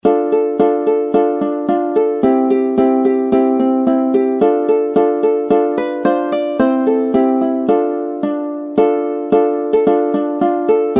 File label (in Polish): Morskie Opowiesci ukulele